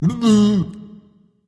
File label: tongue berserker; cuchulainn